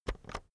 keyboard external enter press slow

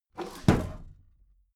desk drawer close heavy

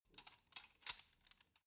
cutlery forks handling short

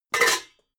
pots clank hard

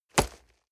nylon leather hit hard

